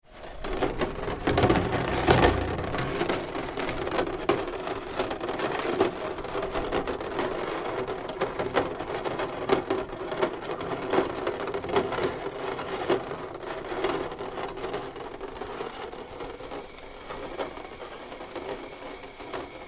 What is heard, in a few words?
rain sim